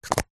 household insect repellent roll on plastic lid place on; zapsplat